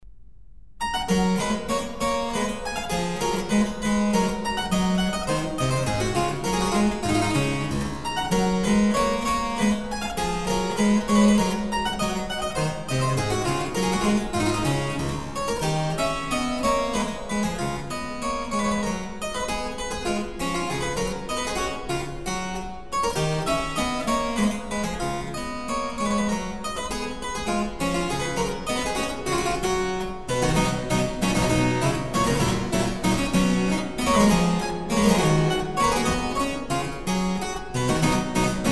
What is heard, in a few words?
Minuet in G - Minuet in C; Mozart